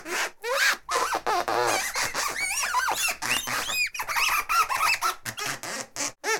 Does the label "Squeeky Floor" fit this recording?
Yes